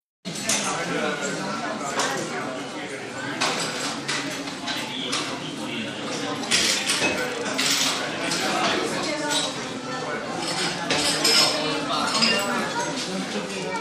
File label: French Cafe Bright; bars & discos